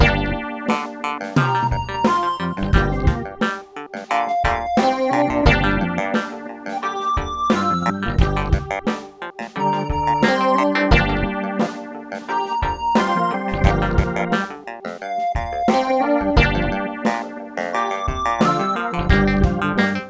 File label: w gtr; Diamond Princess